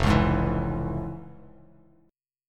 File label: F Minor Major 7th Sharp 5th, F, Bass Standard; FmM7#5 strummed; 1,4,6,9